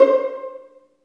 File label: String Vlns Pizz